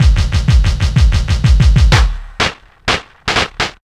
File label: crossfire, Deep House 125bpm